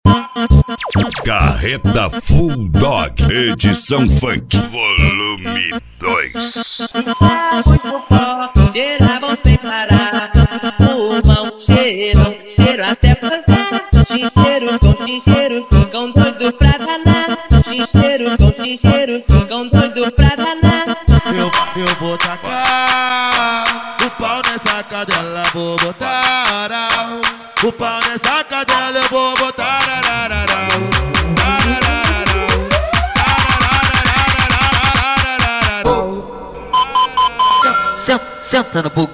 funk

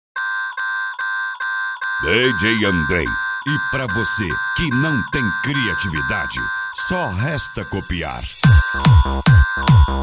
pancadao funk bass

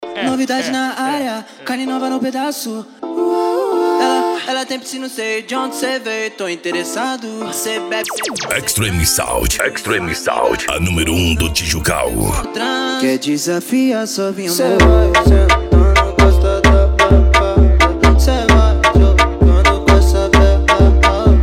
funk

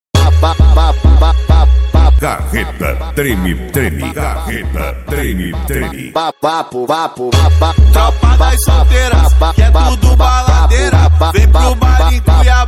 funk mega bass

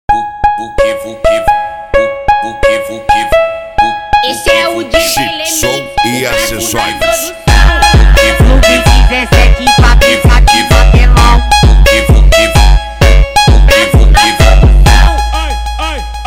especial funk

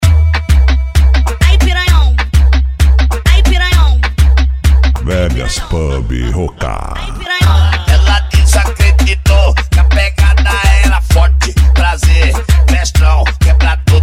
funk mega bass

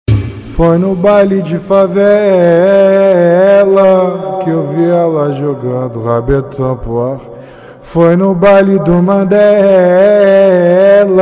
especial funk; cd bad boy som automotivo usa

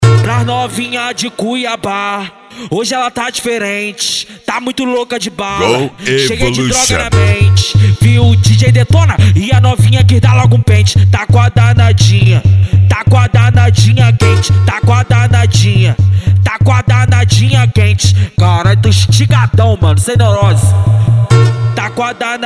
funk tumdumdum funk bass

